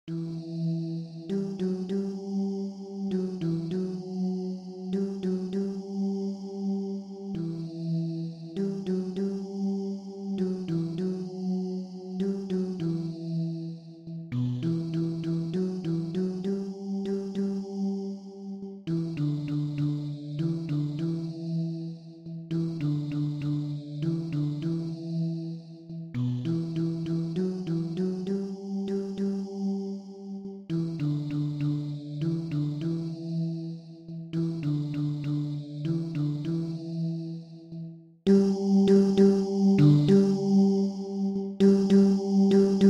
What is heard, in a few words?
Basses